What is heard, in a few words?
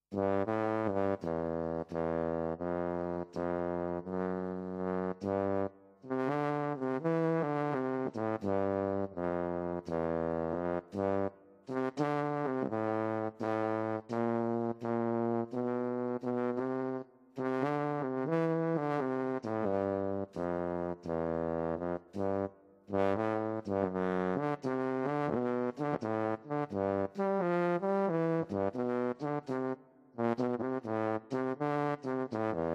blues tuba